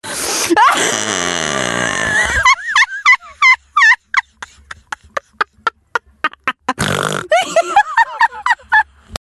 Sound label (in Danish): snorke ring